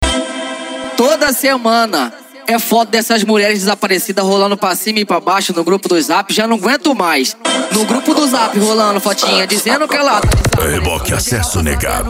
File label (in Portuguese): racha eletronica e funk mega bass